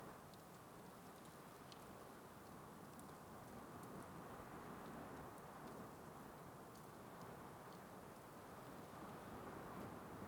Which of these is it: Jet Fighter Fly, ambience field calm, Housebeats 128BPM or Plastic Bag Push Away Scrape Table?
ambience field calm